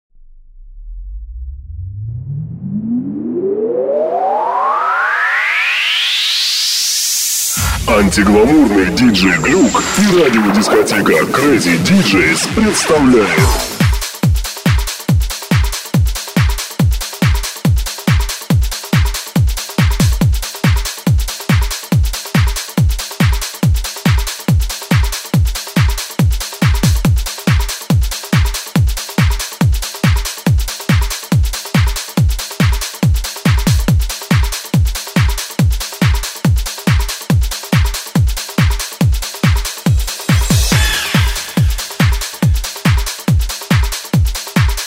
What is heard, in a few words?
Pumping-Hard House